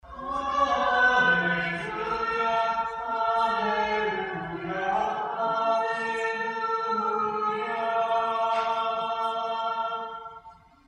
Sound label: Ringtone Alleluia